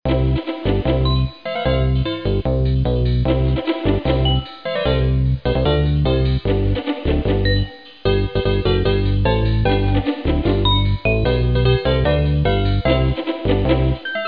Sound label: Music; T.J. Finds a Friend